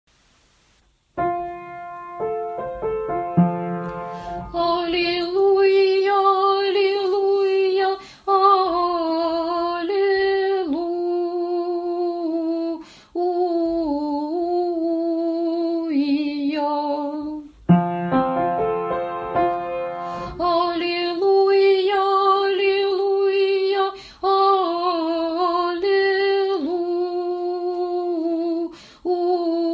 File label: Alleluia Ton2 Alto; Voix alto